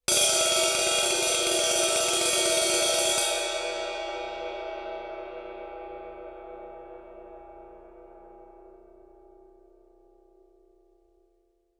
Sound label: ride stick roll, Suspended Cymbals; Percussion